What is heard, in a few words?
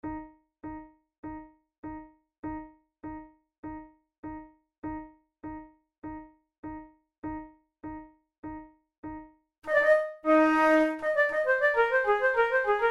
in e flat major, flute